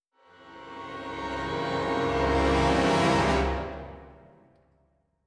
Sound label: strings cluster; Netease; gank